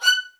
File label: F# 2, soft violins; Q Up Arts; Denny Jaeger Master Violin Library